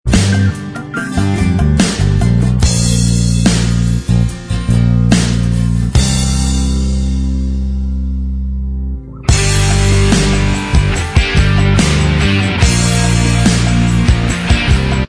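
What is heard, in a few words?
instrumental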